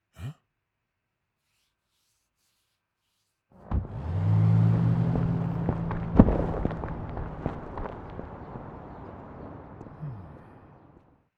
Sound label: Ties Ropes; yesterday origins gamerip 2016 switch ps4 pc ios macos